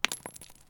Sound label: grenade dirt; STALKER; IWP